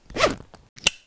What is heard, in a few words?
shield; cstrike; snowwars